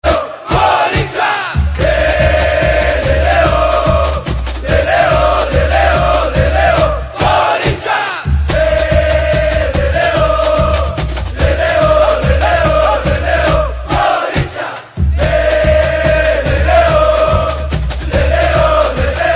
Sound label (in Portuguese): gritos de torcida da fiel; timao